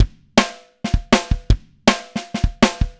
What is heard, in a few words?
Beat, Drum N Bass